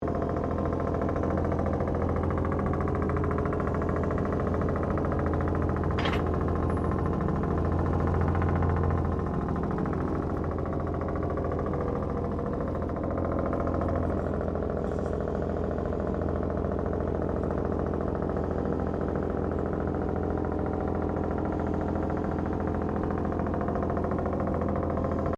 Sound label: Train Diesel Shunter Va1 Class 1947 Tasmanian Idling Close Loop; Bellarine Tourist railway vehicles; Trains